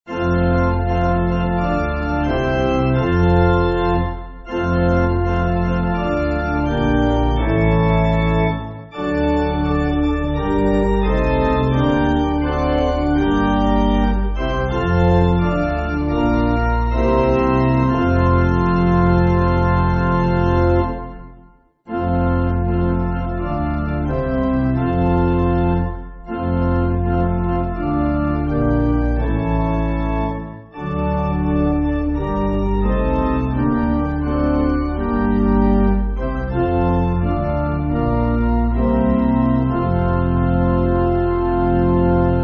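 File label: Pipe, Music Backing Files for Churches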